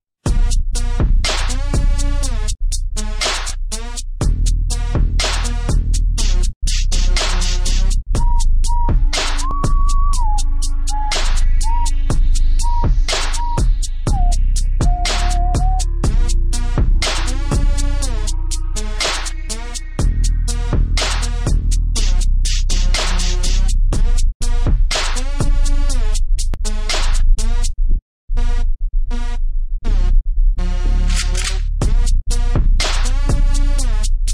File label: Hip-Hop; unofficial roblox soundtrack deluxe edition; android ios macos windows xbox one